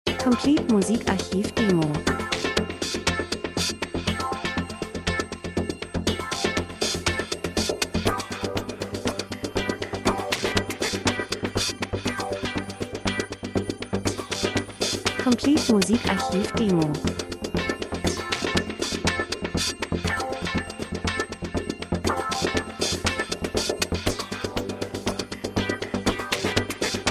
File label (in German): drums, rhythmus; Elektro Rhythmus Percussion Autofahrt Straße voran aktiv Lichter Impressionen Eindrücke Reisefilm Natur Dokumentation